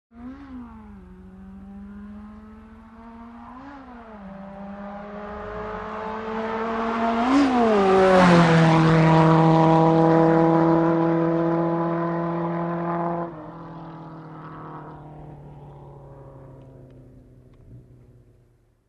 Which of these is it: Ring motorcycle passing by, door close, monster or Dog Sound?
Ring motorcycle passing by